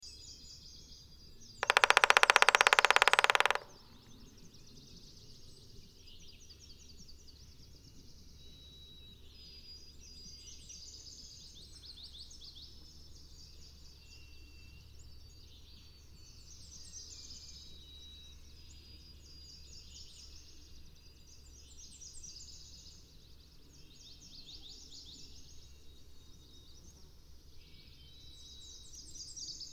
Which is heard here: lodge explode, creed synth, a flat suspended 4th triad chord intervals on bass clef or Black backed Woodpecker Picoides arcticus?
Black backed Woodpecker Picoides arcticus